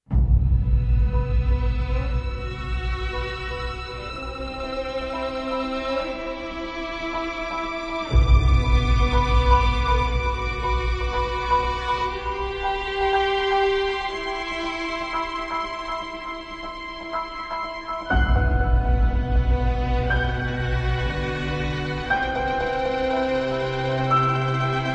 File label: Suspicion Ambient